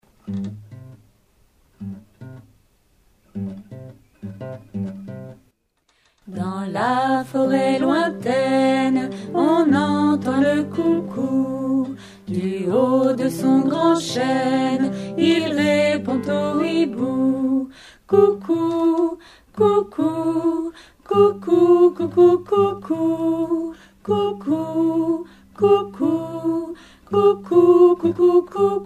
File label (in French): canon; Dans la forêt lointaine